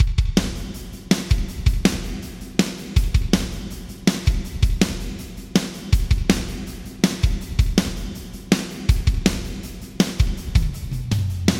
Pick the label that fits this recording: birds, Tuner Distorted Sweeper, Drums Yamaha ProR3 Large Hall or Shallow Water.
Drums Yamaha ProR3 Large Hall